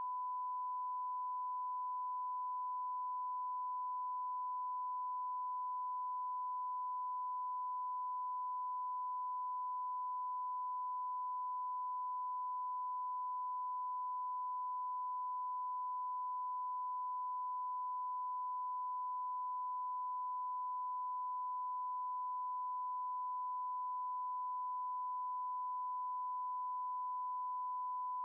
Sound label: sine 1khz minus20